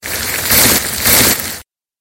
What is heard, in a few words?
monster fire mites